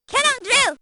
cannon drill

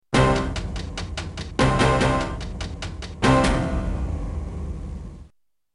Music Game Over

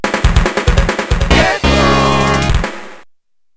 get goal; 32X

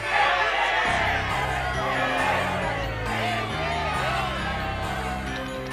crowd chant; yu yu hakusho

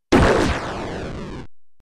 laser S

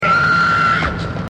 scream

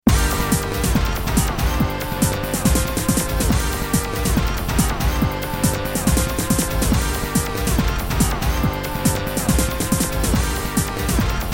music victory; juggernaut

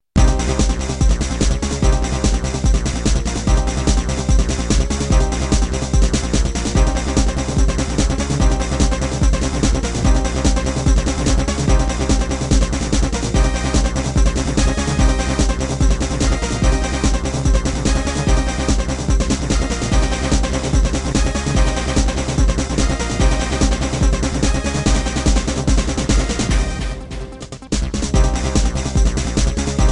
Music Boss

